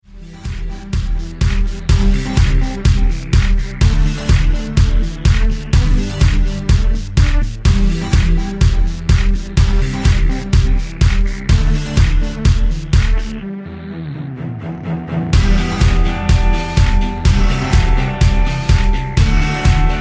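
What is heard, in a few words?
Merry Go Round Instrumental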